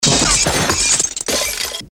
destruction body impact

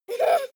child laugh short

